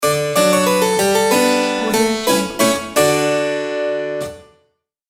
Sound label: happy classical harpsichord